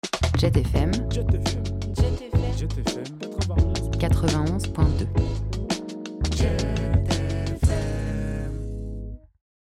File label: Jingle Long; HABILLAGE